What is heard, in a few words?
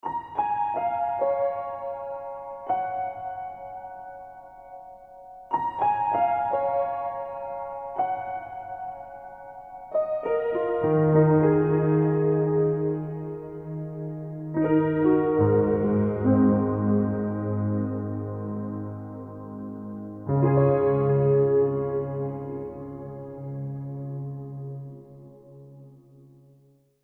Piano FX; Beds